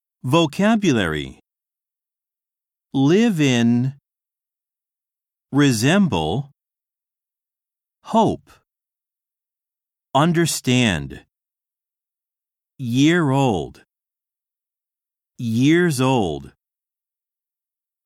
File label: harmony; 新課程英語教科書 指導用音声CD